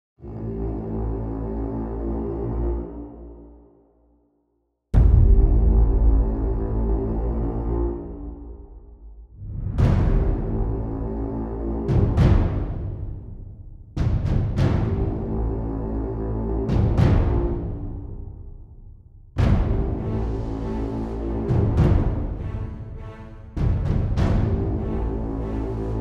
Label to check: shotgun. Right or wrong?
Wrong